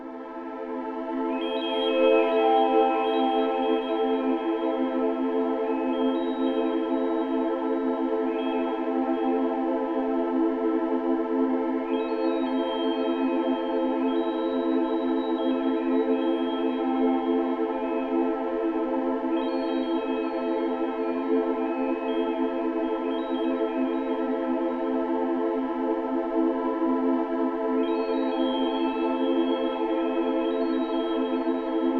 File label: Pad A Minor